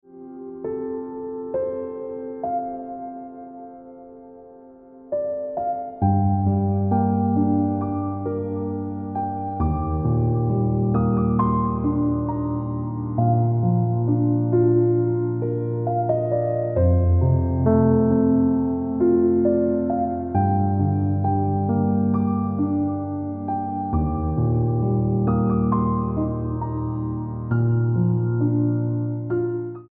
Ringtone

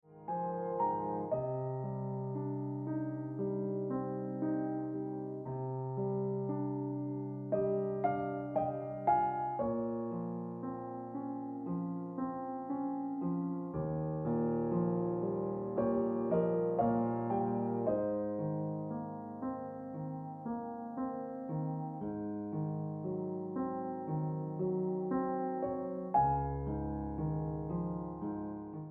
Ringtone Piano Music

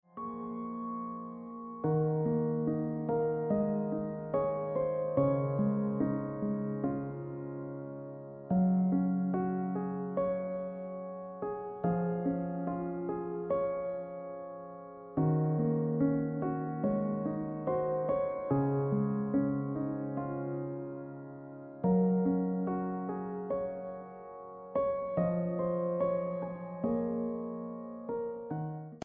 Piano Music

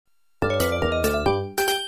Ringtone

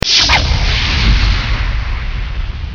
Pshhuuu Sms Ringtone

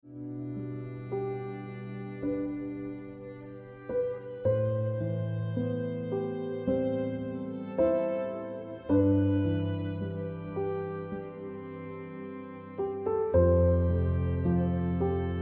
Ringtone Piano Music

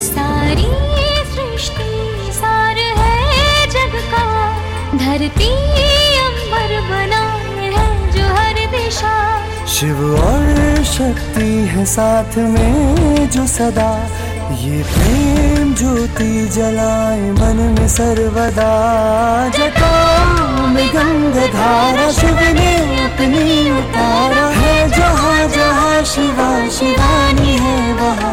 Serial Song Ringtone; Shiv Shakti

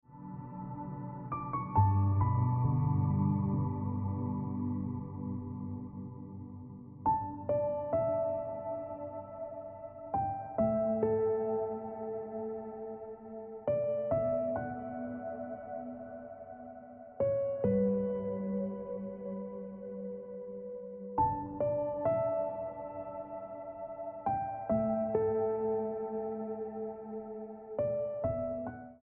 Ringtone Piano Music

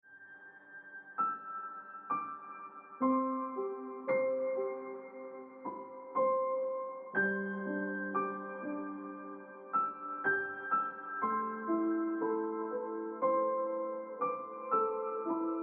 Ringtone Piano Music

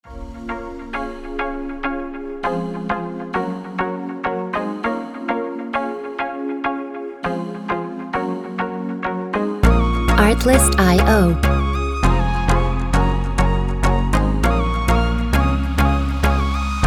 Beach Vibes Ringtone

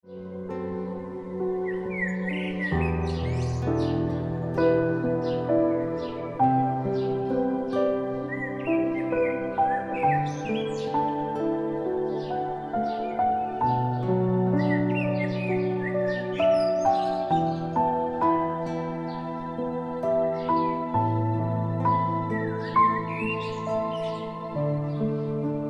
Piano Music

